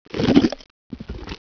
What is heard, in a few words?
CHOMP